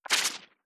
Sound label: electricity layer; custom weapon; k31; seers